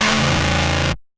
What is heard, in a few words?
Electro Troll; martyn stonehouse